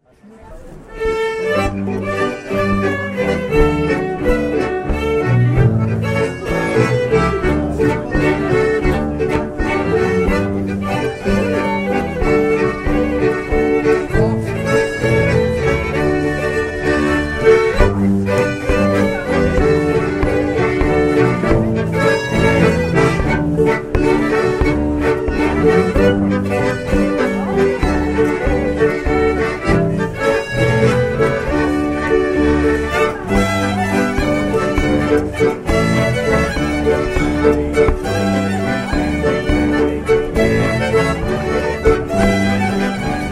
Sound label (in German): Triefacher; Regen 2010